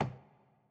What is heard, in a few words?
boink